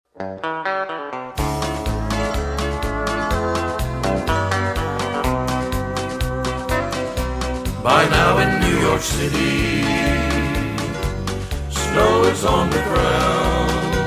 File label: harmony